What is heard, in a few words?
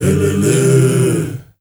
MALE HUM; Spectrasonics; Heart of Africa